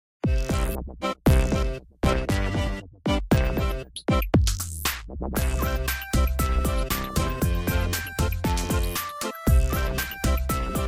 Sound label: music menu; Boomerang All Stars - Super Goalie